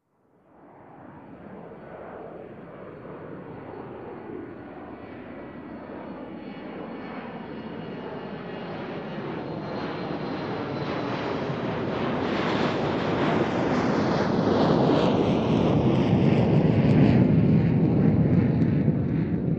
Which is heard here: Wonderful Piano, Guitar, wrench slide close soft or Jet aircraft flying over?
Jet aircraft flying over